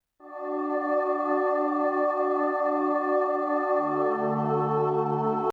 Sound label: choir pad before